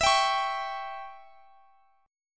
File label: E Minor 6th E, Guitar Half Step Down